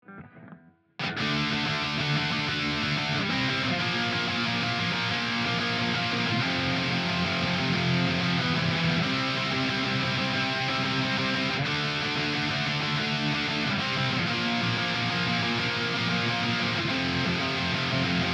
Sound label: guitar off